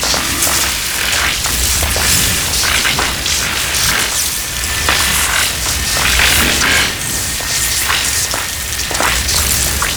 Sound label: Void Beam Loop; Weapons; Warframe; Duviri; Incarnon Adapters